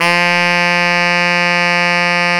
SAX TENOR F, SAX Tenor ff

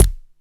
EH32 SD, Electro-Harmonix DRM-32

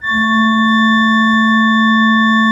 A2, Cathedral Organ

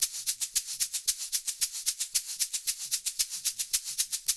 LOOP 5 L, Percussion; InVision Interactive; GRUNT

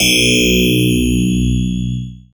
SYN, Roland, SYN DIGITAL; Sound & Vision - Gigapack I CD 2 (Roland)

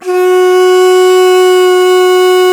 World Winds, Old World Instruments

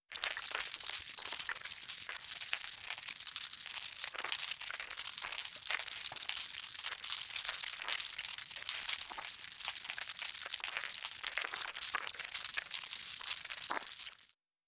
texture; croc; ambient or nature

